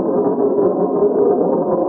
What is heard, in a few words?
Rolling Hard, marble; Marble Blast Gold